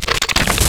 Scorpion, Wavetables; Cymatics; Serum; Animals for Serum - Gold Edition

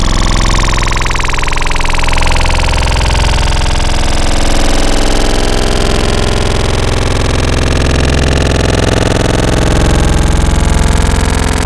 Growl Tables; Blaze; Cymatics; Growl Tables Vol 2; Serum

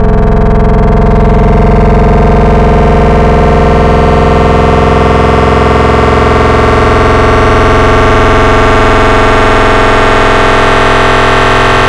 FM Table, FM Wavetables; Cymatics; SERUM

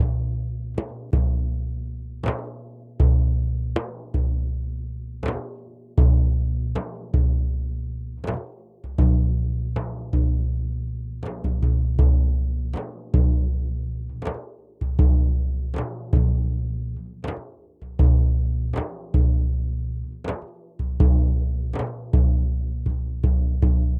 duff 080, Hip Hop India 080bpm; Hip Hop India Modern Percussion

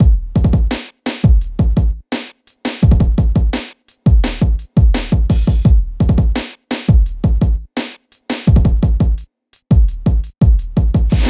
Drums, 085 Bmin

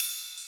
EDM Future Ride, Cymbals; Big EDM; Future House Tools

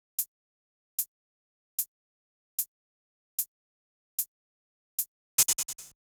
Hats, 075 Bmin, Drum Tracks

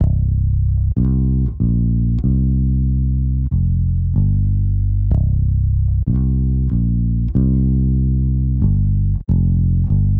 Bass, 094 Cmin

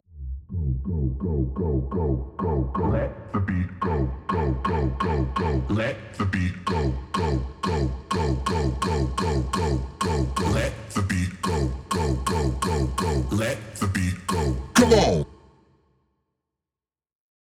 Vocals, Future House 126 BPM E; Big EDM; Future House Tools; Construction Kits; Let The Beat Go

